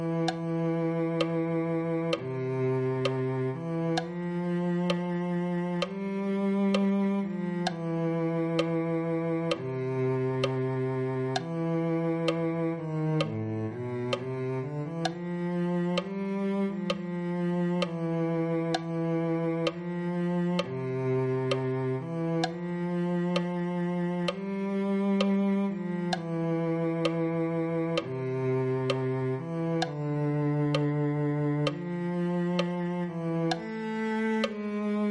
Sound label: cello